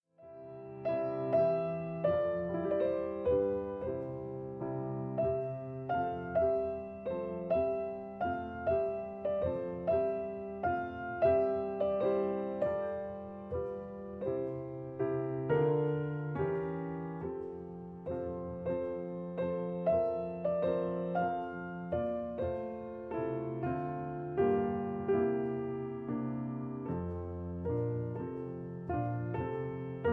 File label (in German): ballade f dur